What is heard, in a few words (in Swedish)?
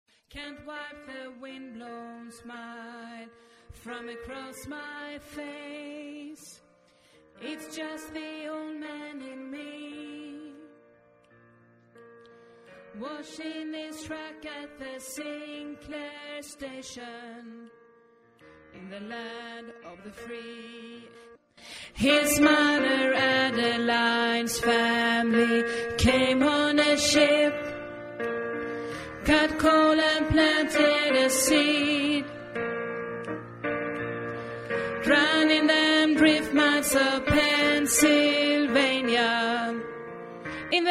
alt, stammor